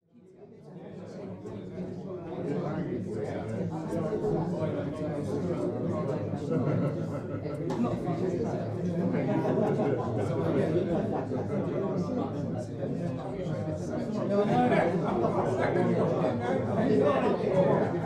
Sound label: Crowd Of Extras In A Waiting Room